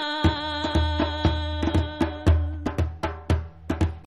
test signal

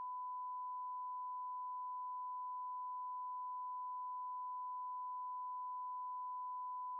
ref -45 dBFS 20-20 kHz sin 1000 Hz

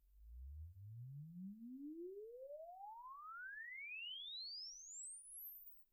Loudspeaker uncal sweep

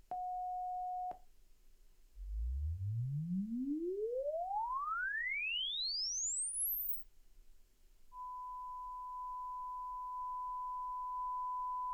JBL 70cm cal meas signal measured

